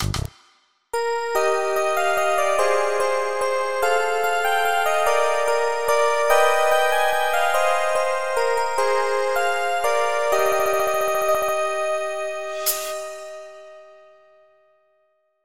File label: Christmas Bells